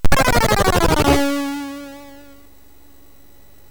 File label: Boing, MOS6581R3; sid